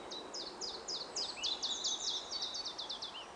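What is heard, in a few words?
iberian like, collybita; Draycote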